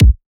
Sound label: kick oneshot, Pop; Slate; SD PASADENA Pop Sample Pack